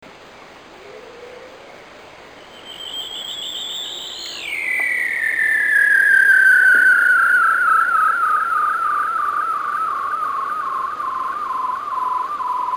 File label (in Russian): Голоса животных – Морской заяц